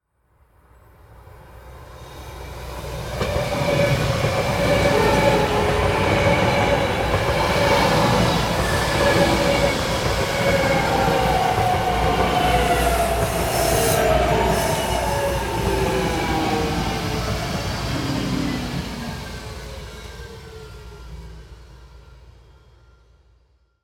Field Recording Of A Train Bart In South Hayward Ca